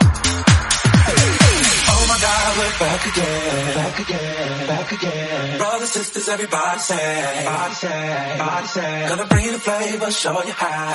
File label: House Mix 129bpm Fm